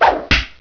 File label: WHIP